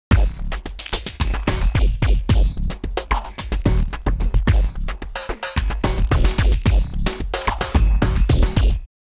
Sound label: 120 Notre Dame Beat Mix